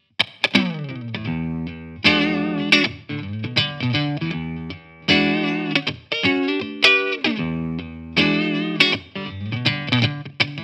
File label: Lead